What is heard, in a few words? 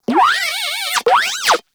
Moa Compassionate Vocal Reward Praise; Warframe